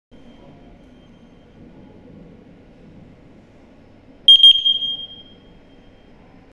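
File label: fault signal, smoke detector; ZR180S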